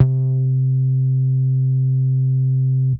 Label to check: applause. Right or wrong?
Wrong